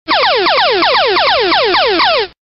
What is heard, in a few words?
jeopardy daily double sound effect